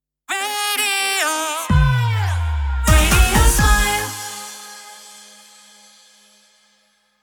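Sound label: Sung Jingles, 127bpm F minor, Sting; Radio Smile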